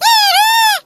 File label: Squeak hurt